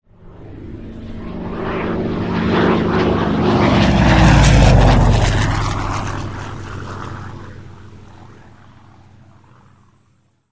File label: yak-11 passage, Aircraft sound record